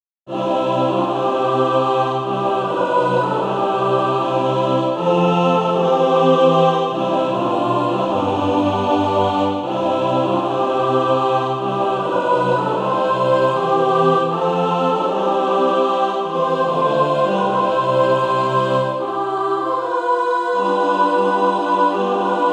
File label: mixed; SATB